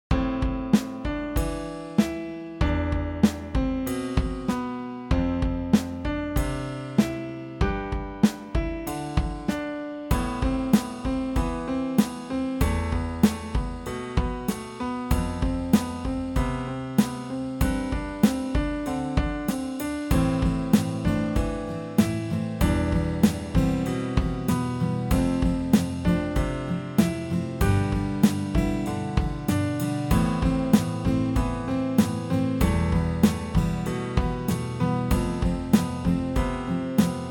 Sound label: Rain Rain Rain